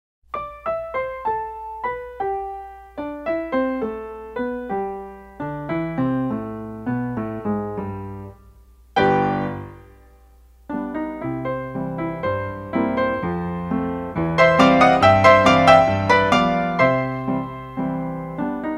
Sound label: The Entertainer piano roll; Scott Joplin